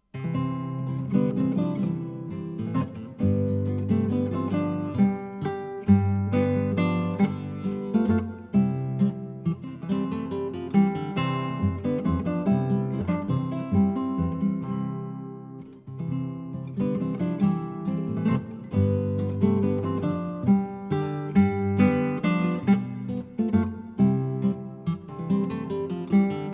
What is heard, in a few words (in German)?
Almröserl Walzer